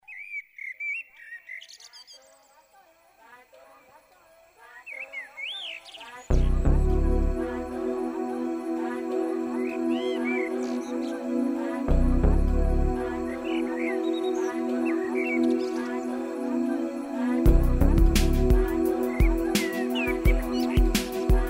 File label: night bird